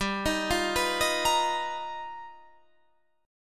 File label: G 7th Sharp 9th Sharp 5th, G, guitar chord; G7#9#5 arpeggiated